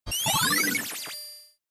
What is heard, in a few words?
sound effect obtained truth bullet; danganronpa